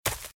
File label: Footsteps - Leaves - Sprint - Left; RadwinIsland